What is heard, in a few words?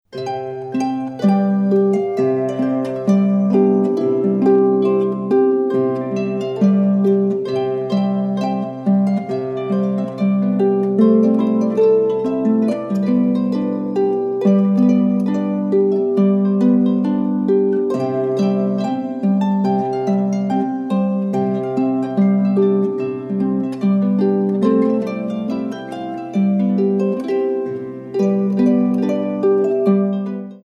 Trumpet Tune